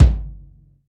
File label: smooth bottom end steel kick drum sample g key, Royality free kick single h